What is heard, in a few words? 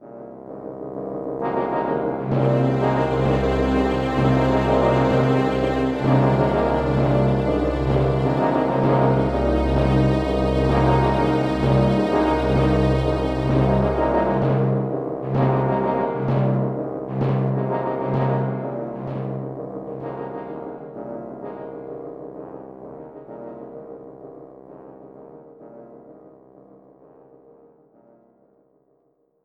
bomb drop; CD Action; fly